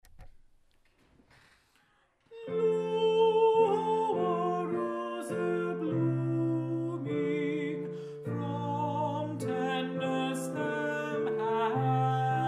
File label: Alto; Lo, How a Rose E’er Blooming, M. Praetorius; Advent